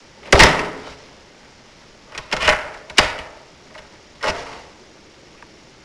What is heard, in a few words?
Door